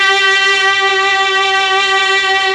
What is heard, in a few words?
STR, STRING; ILIO; Propeller Island Legendary M400